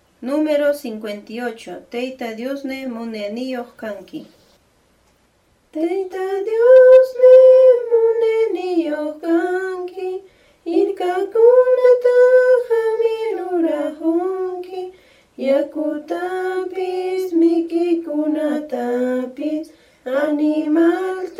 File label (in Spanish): cancion